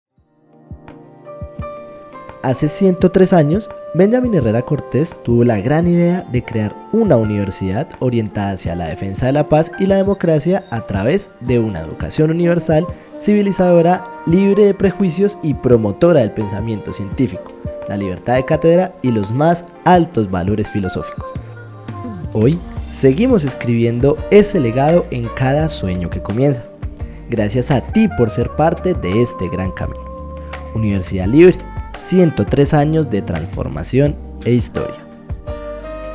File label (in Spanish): Celebracion Unilibrista